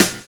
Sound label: SD, Drums Dance & Groove